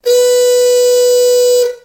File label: long honk